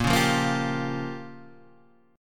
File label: G Minor 7th Flat 5th